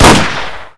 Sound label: ber93r fire; firearms